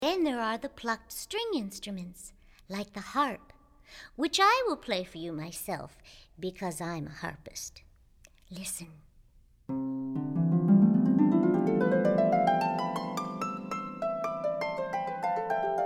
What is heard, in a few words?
Harp